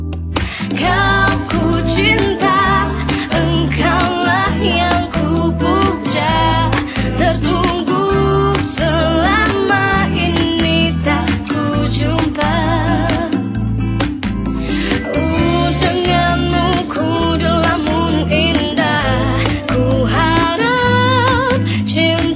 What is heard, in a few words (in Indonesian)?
Akustik